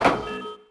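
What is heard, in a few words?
City Button; interface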